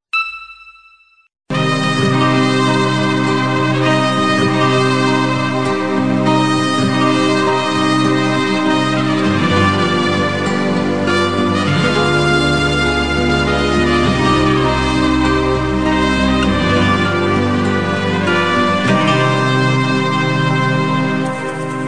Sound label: samba